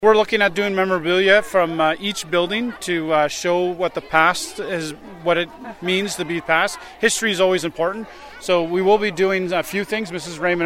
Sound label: shovel; shovels ground Pope Francis elementary school renovations